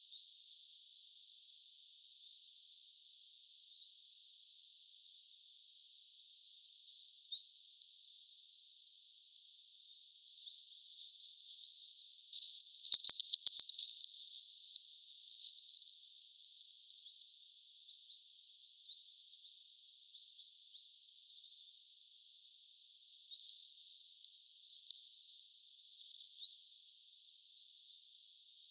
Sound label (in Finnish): pikkulintuja saaressa